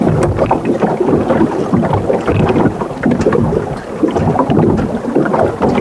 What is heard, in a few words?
water in boat